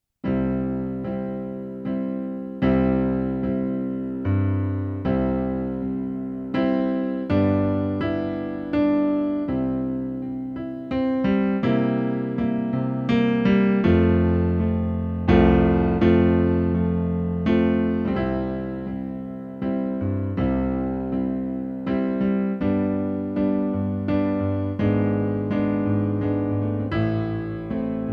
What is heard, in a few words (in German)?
Piano C Dur